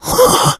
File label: death, infected